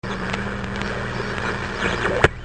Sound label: Tuning Radio